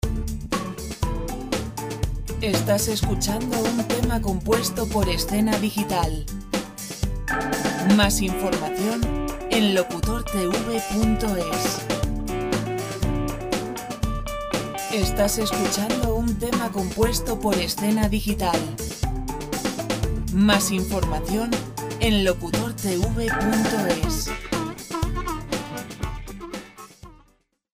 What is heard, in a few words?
120 pop